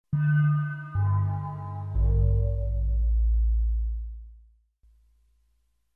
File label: spooky bells